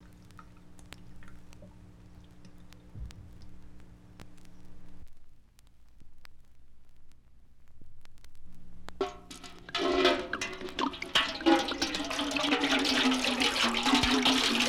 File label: Pouring Water Into Bucket